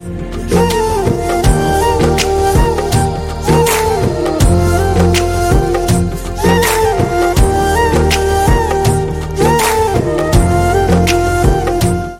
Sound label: Rata lambiya flute